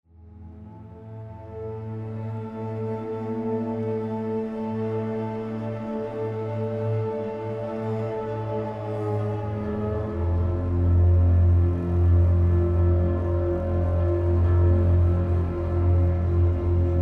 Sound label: Tension Block